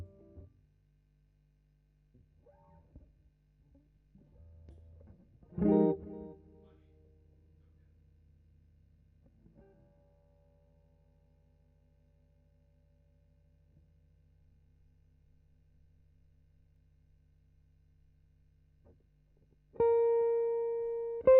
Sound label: GTR B